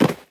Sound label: Rattle Snake; gamerip